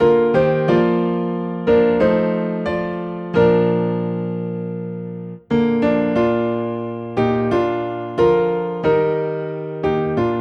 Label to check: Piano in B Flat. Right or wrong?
Right